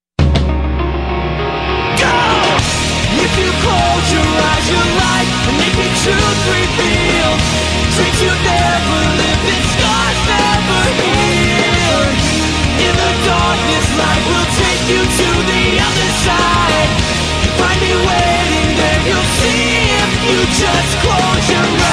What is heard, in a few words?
Christian ringtone